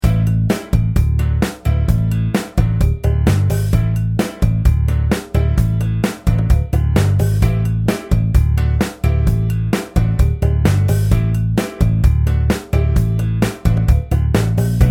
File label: Latin Rock Rhythm